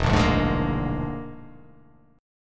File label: E 7th Flat 5th, E7b5 chord; strummed